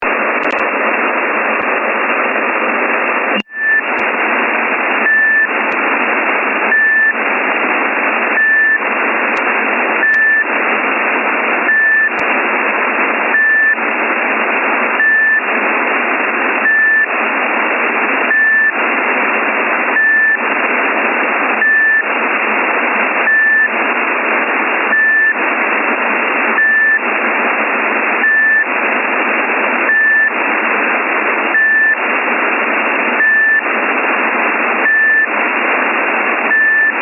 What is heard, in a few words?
sdr radio